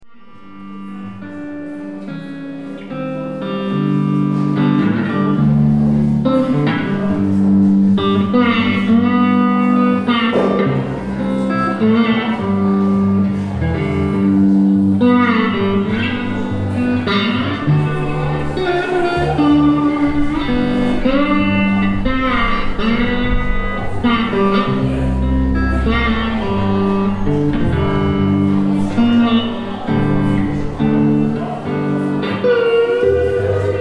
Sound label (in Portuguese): instrumental